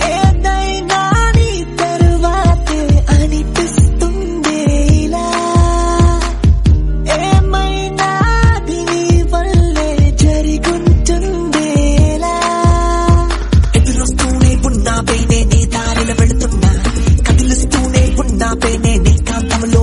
movie song telugu

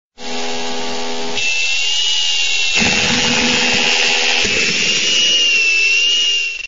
dentist funny

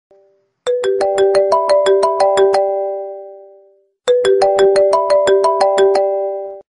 iphone apple sound instrumental

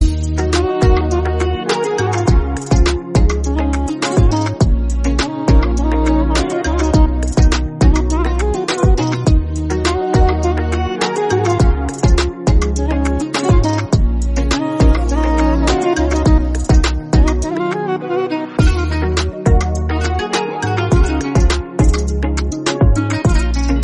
instrumental song

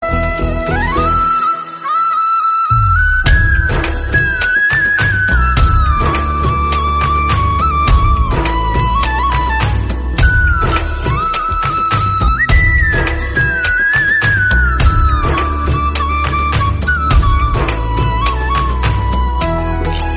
malayalam flute bgm

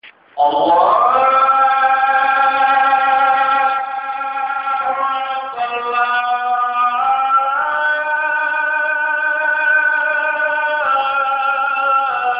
azan

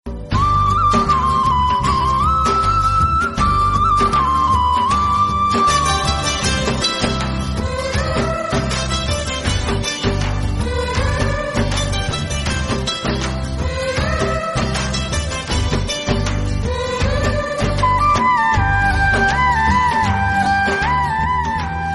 royal rajesthani bgm

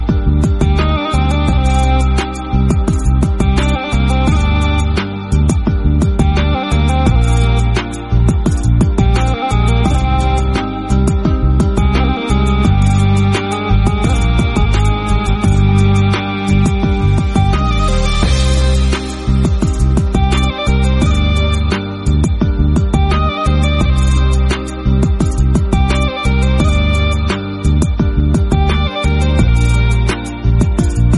turkish bgm

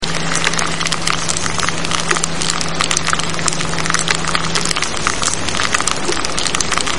rice crispy pop loud

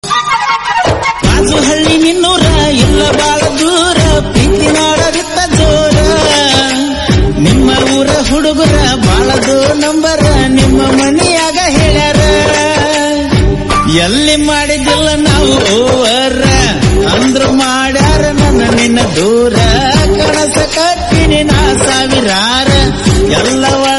kannada janapada, kannada love

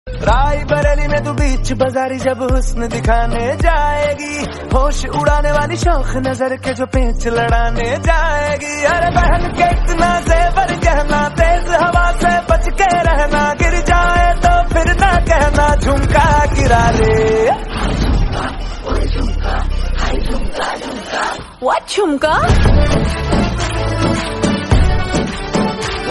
hindi bollywood

